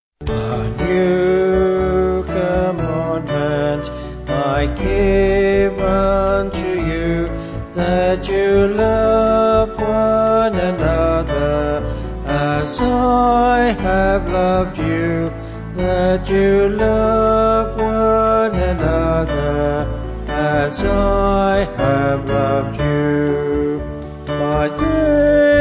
A New Commandment Vocals Band

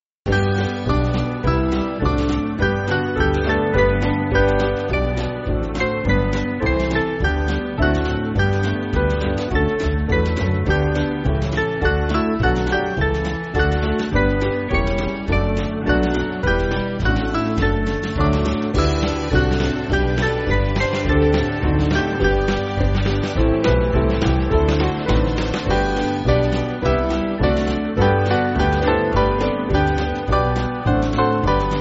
Band, church music

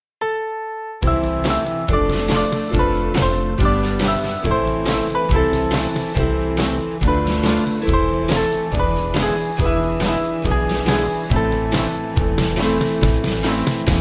The Birds In The Forest, Band; Norway

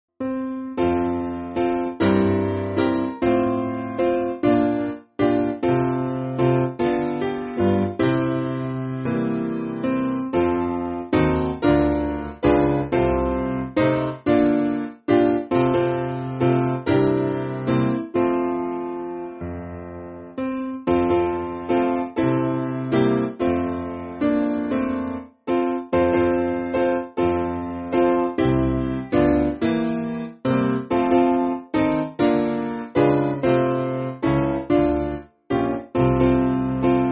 Piano; Take Up Thy Cross; Falls Creek

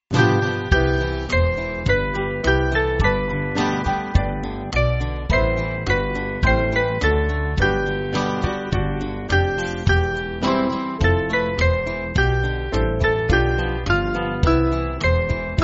Band, church music

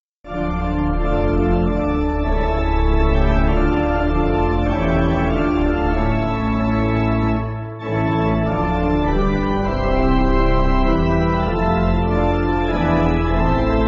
Pipe, church music

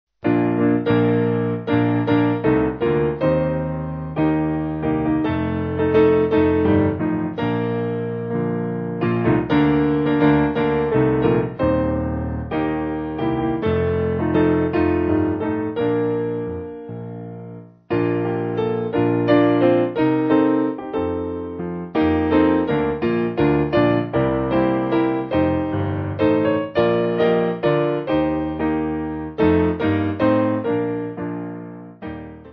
Piano

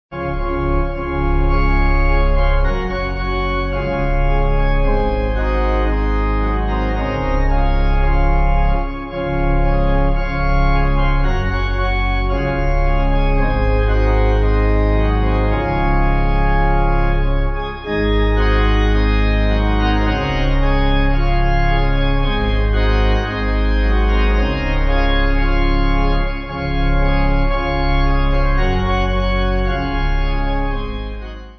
Pipe

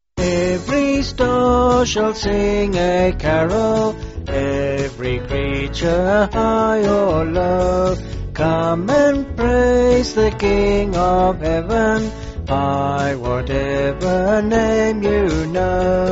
Vocals

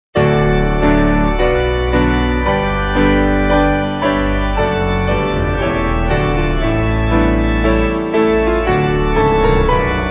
Piano Organ

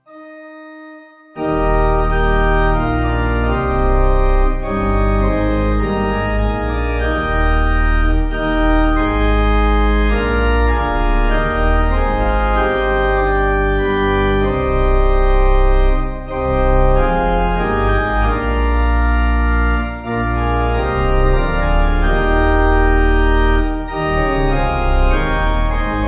Pipe

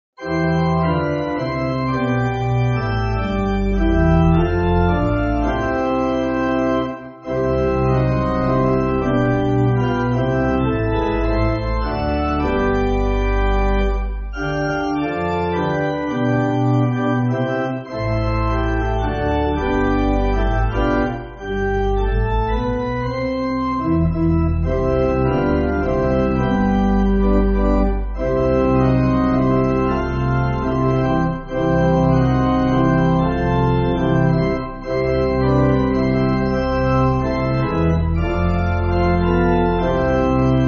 Pipe Organ, church music

